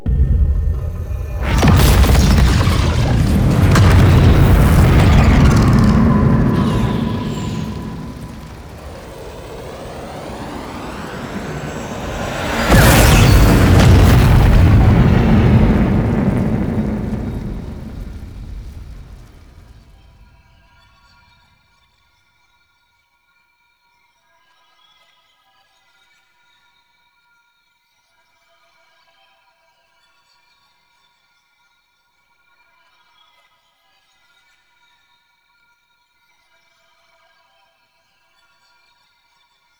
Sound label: End Cin SFX; TheTeacher; Cinematics; EndCinematic